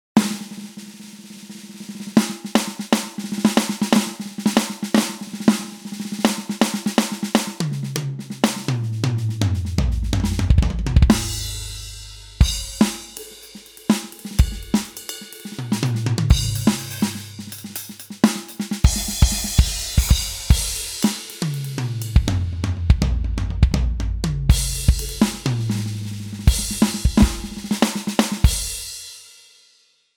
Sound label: BEAUTY DRUM; VaultX